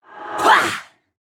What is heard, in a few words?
Grunt Physical; Hunter; Realm Royale